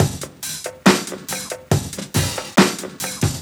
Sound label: Slow Motion Beat 140 BPM; Vengeance - Essential Clubsounds Vol.3; Loops 140 BPM